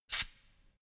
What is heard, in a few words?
pneumatic pump short burst soft